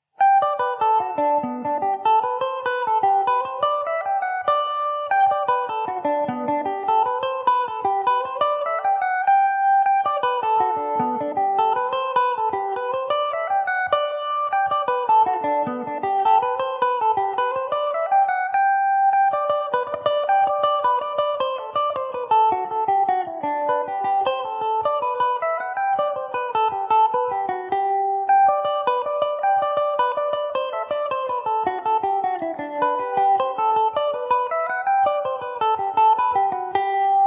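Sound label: jig G major mandobird, irish mandolin; The Minaun Jig; Epiphone Mandobird VIII electric mandolin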